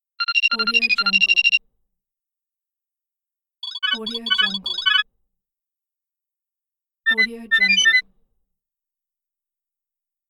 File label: ringtones pack